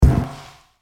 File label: Footsteps on metal surface boots single step